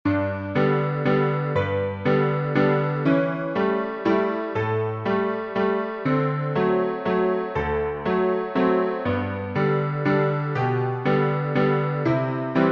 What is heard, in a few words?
piano